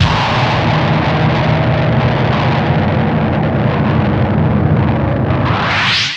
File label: SMASHEKO, SPACEY DRUMS; Spectrasonic Distorted Reality 1